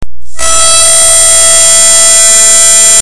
harsh harmonica

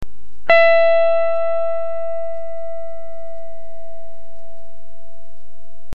gutiar E52

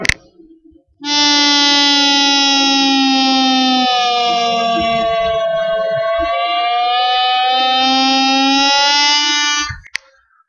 disolated bend compressed

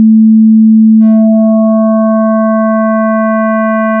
alt dist

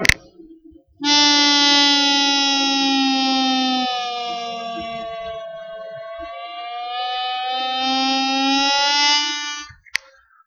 disolated bend

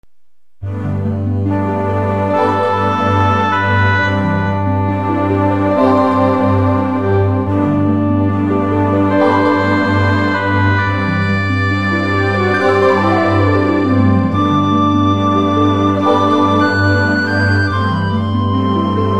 forest

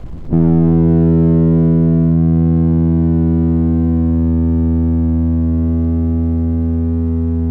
low note tone

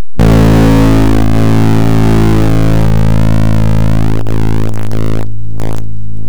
guitar a1 alt distortion

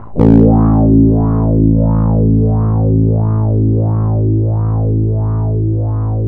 alt dist wah

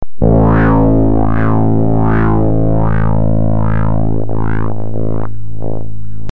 wah

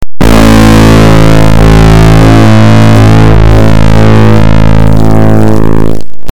gutara 1 more distorted

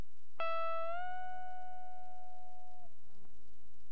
bent note

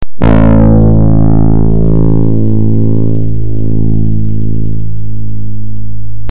guitar a1 distorted

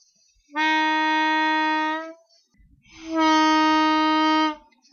e flat blow bend then overblow

